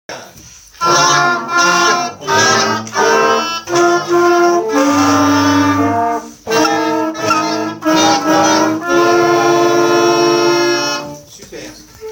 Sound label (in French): Orchestre